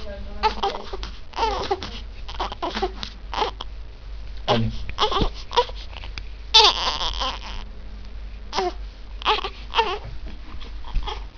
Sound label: tom